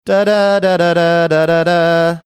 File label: singing portion